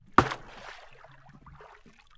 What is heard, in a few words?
Water; TOOLKIT vol.2